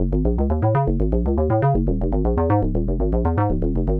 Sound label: SH101 Arp (120BPM), retro house